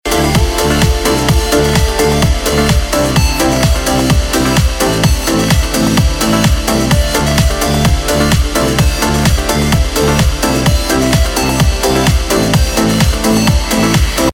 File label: Female Remix, Music, Bollywood; Khamoshiyan